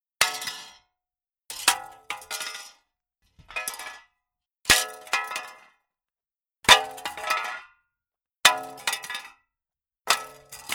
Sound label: Metal Junk Drop Small Several Takes; Clanking and rattling from various metal hits and drops.